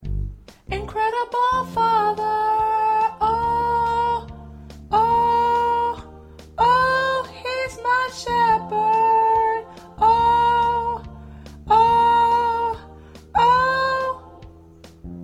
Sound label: Ensemble Soprano, choir parts